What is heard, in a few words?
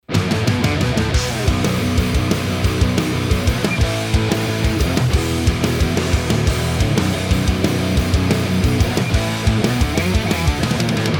MIX MESA QC